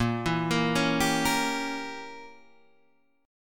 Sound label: A sharp Diminished 7th; arpeggiated; JGuitar